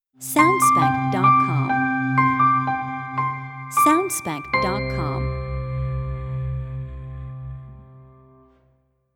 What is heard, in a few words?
Elegant Piano Logo